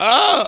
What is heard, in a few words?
scream